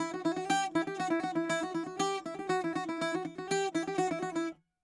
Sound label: bouzouki